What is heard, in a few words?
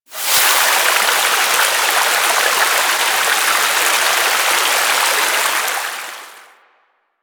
Water Fountain Burst; Ambience; Props; Warframe; Nineteen Ninety Nine